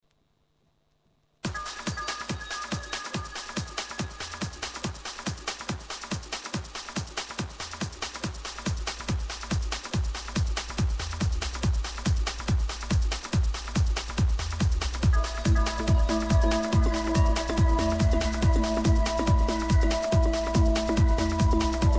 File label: Techhouse And Mainstream Techno